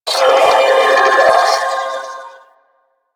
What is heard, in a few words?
Sentient Symbilyst Attack; Enemies; Barks